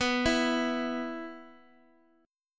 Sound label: E 5th B